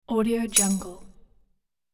metal chime rattle

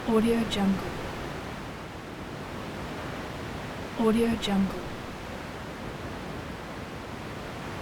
waves loop; bogdansfx

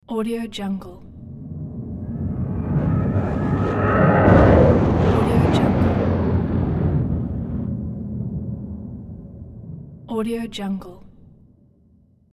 bird of prey by

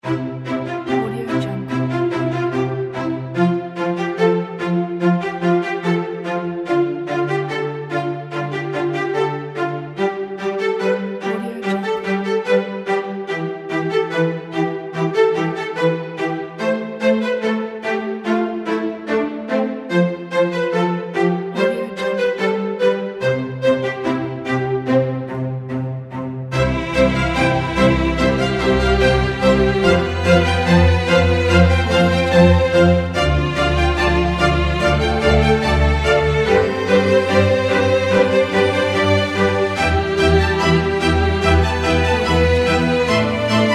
the classical

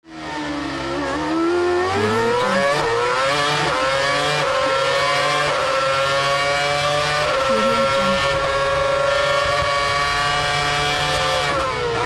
inside the formula 1 car pack

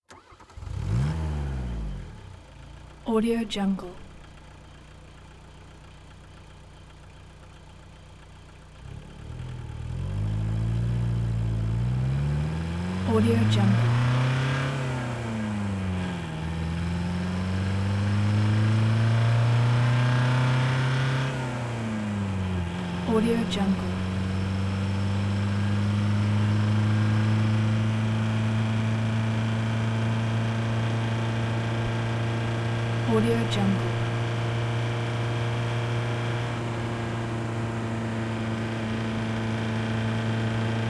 volkswagen rabbit car 5 speed diesel

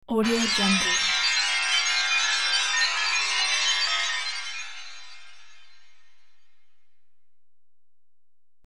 electronic wind chimes; sound ideas

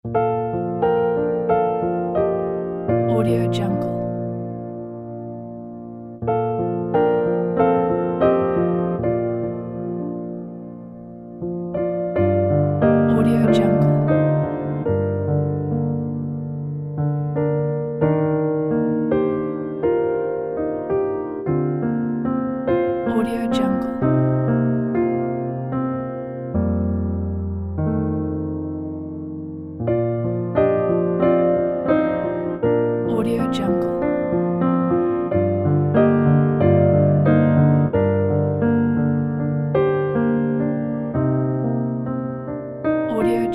calm river

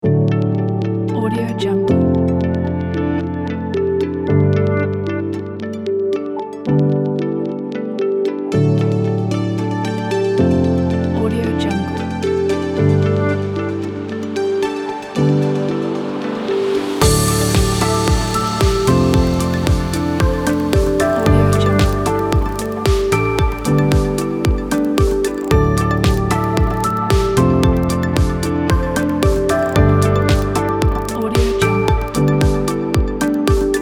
high technology

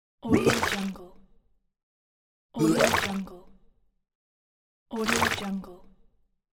puking in a paper bag; aetherfx

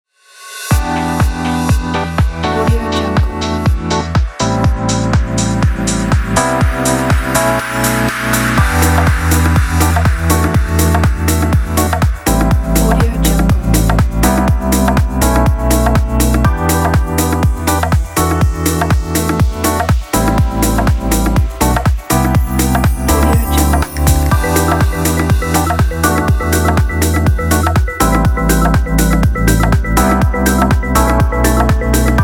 street cafe

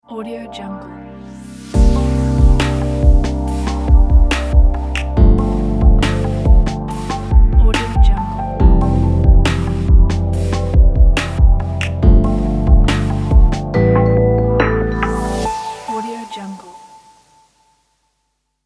chill ident; alexanderrufire

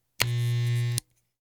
electric shaver short on off switch click

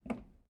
door bangs; prosoundfx

